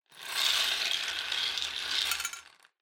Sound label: Drawing Machine Garapon Spin Drop